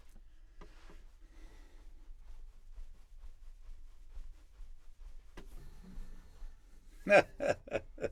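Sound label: Contrabass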